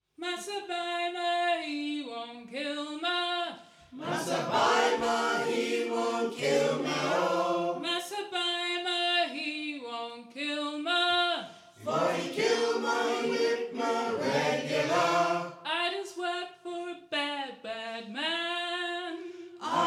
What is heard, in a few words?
Song Slow, Barbados slaves work song; c1780